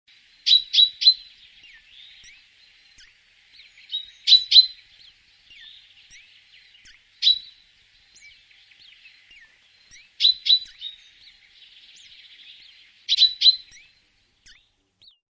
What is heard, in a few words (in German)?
Kohlmeise